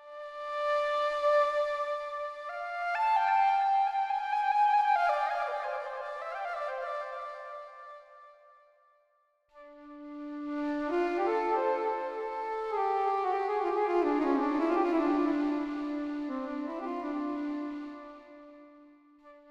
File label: EWI Solo Jazz Flute